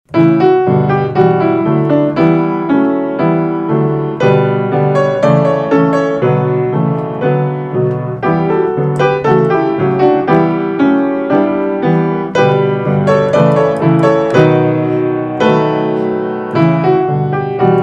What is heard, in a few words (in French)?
version piano